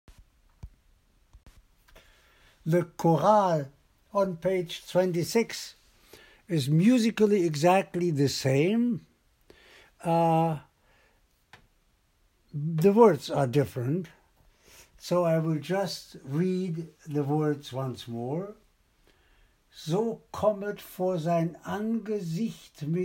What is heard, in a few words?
BWV 117, Sop.I